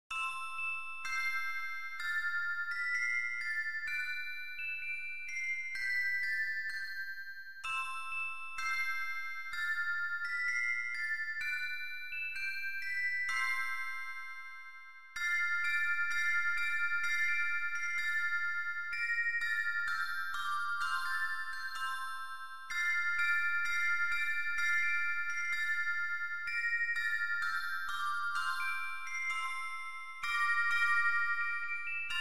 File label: bpm128; anpanman